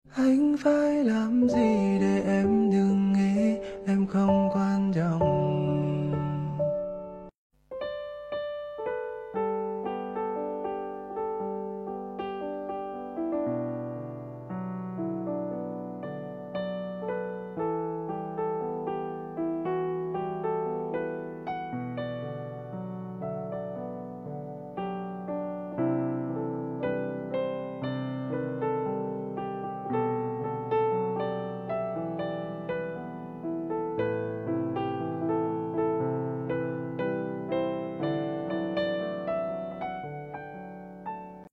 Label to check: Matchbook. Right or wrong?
Wrong